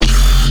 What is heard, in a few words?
Growl F, bass